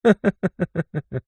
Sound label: human male middle aged laugh short; zapsplat